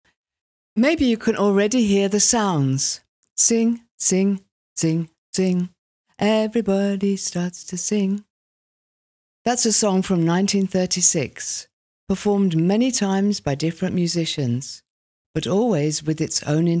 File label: Big Band; GLOW; Welkom bij The Beat, het thema van lichtkunstfestival GLOW 2023.